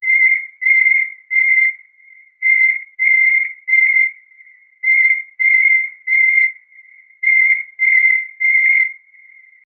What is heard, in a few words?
usa chant